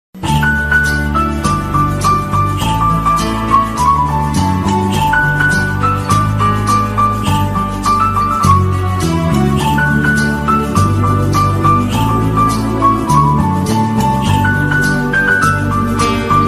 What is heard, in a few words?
bgm friendship bgm; jilla